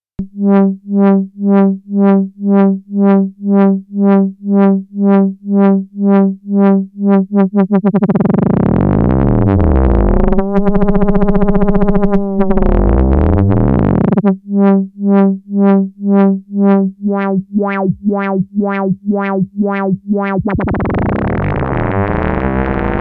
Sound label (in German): FM ohne und mit Resonanz